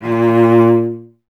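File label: strings cello A#; Miroslav Vitous; Solo Instruments VOL-1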